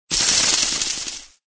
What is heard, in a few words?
win game; betting